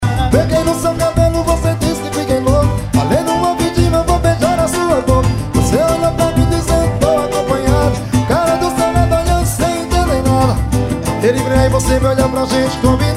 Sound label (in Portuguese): swing samba ao vivo